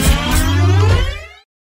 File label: cannon charge up; fusion cannon; transformers themed sensory story